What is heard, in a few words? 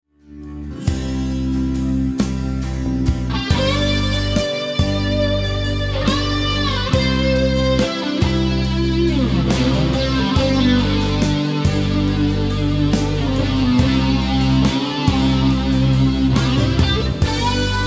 solo Fane Crescendo EHX Big Muff CE2; EHX RH